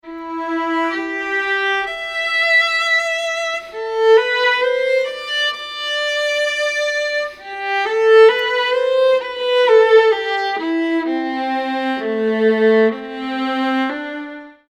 Violin

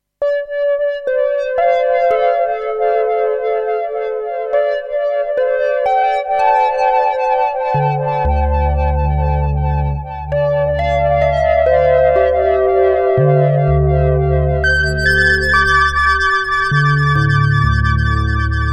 New Age

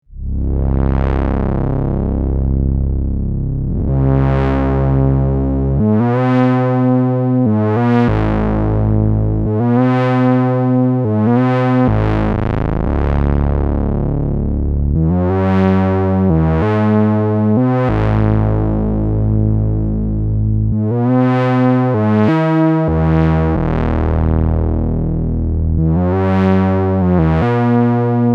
system700 Standard Portamento Bass

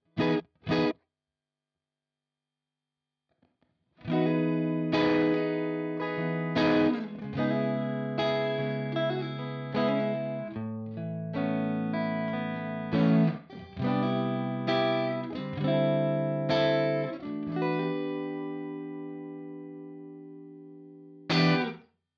Room high; UA Lion

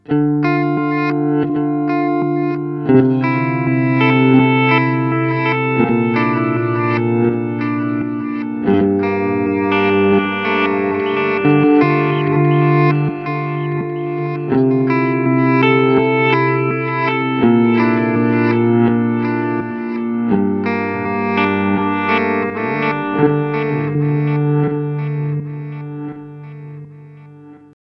Chase Bliss Blooper

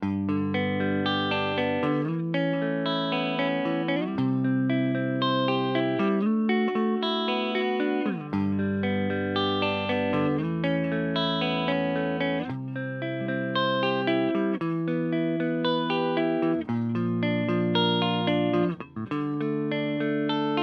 Hagstrom Ultra Max Both Clean

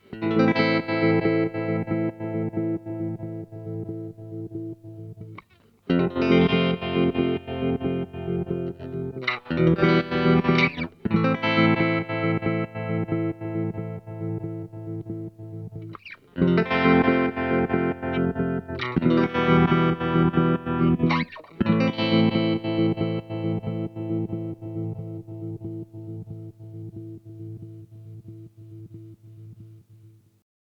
Boss SL 2 Tremolo

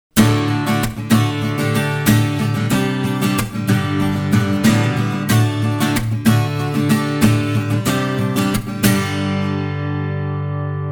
Strumming Hole

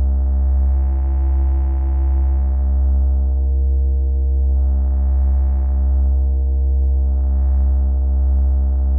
spectraphon slide; Make Noise

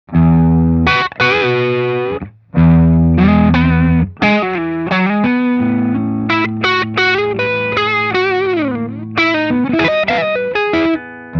Low Gain Medium Tone Lick

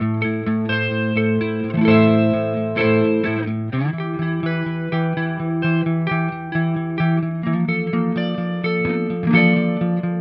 Harley Benton neck clean

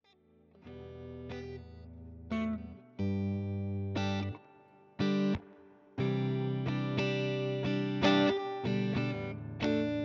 Les Paul; Sphere Direct

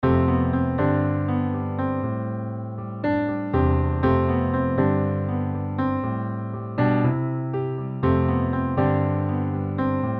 EZkeys 2 Eighties Ballads

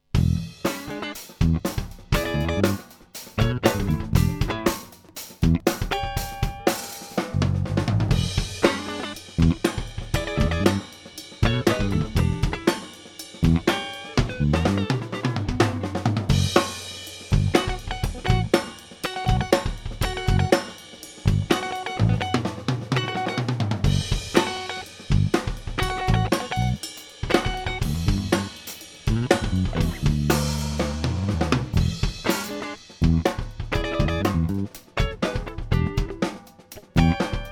Funky Drum Bass Git

